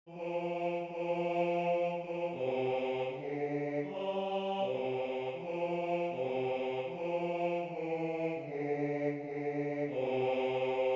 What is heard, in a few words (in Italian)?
Basso; Base basso